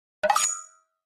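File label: Pop Up Double Tap Spring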